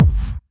Kick, Drum Hits

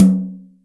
Brsh Jz Hi, Acoustic Tom; Yamaha DTXpress

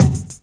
BD, BASS-DRM; EastWest; Dance Mega Hip Hop Electro

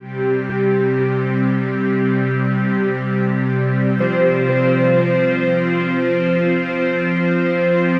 5th String; Sonic Foundry (Sony Creative Software); The Electronic Music Manuscript Collection

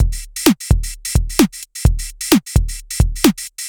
Synth Loop, BPM130

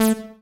SYN Seq; Holly; Best Service Gigapack 1

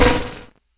SNARE BUZZ; DRUM N BASS:JUNGLE BEATS; DRUM N BASS KIT 2

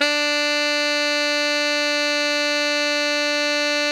TENOR FF D 4, saxophone; Greytsounds; Philip Wolfe David Crozier's Saxophones

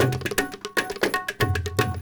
INDIA L; Spectrasonics - Heart of Asia CD2

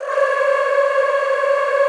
choir, C4; Best Service; Extended Classical Choir

